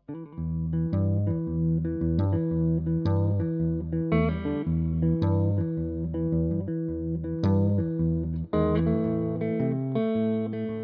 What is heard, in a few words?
Blues test